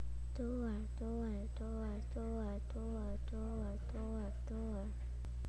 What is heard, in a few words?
door